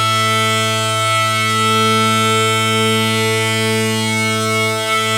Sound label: BAGPIPE; E-MU Formula 4000 Series Vol. 4; Earth Tones